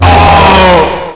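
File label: die, alien controller; ghouls forest2; bzomb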